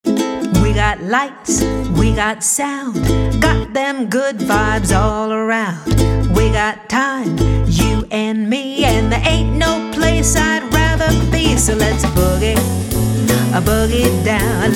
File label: boogie